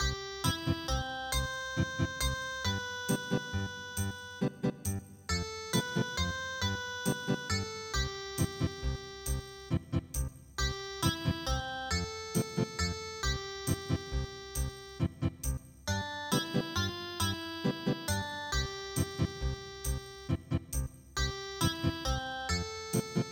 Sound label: Ethnic Guitar